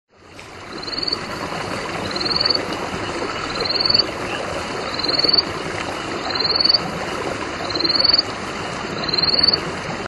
Boophis andohahela

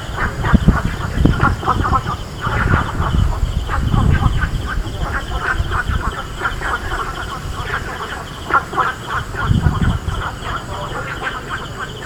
Sylvirana guentheri

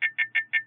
Xenopus victorianus

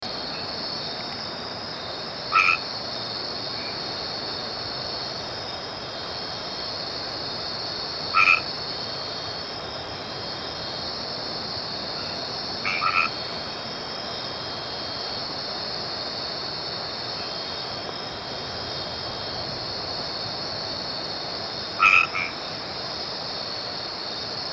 Boana albomarginata

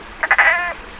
Bokermannohyla hylax

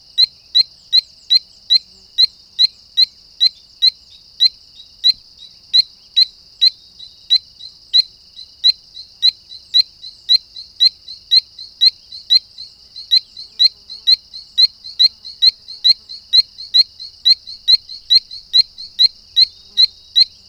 Ameerega berohoka

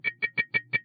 Xenopus clivii

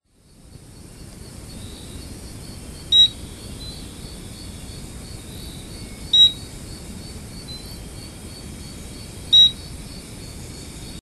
Platypelis barbouri

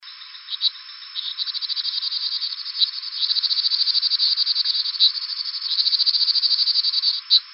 Boophis miniatus

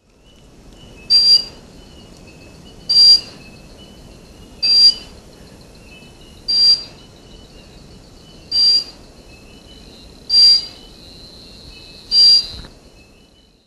Anilany helenae

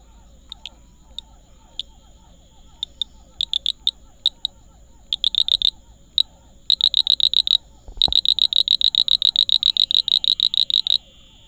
Pseudopaludicola ameghini

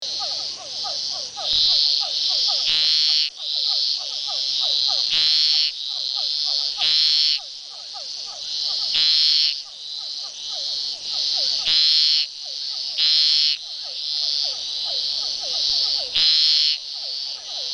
Scinax fuscomarginatus

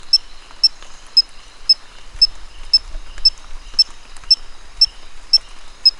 Stumpffia pardus

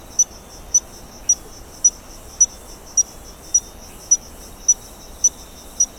Stumpffia achillei

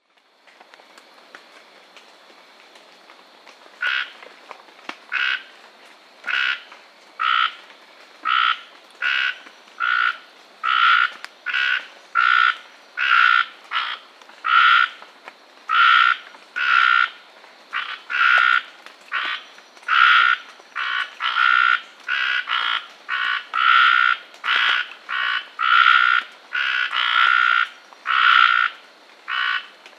Scinax hayii

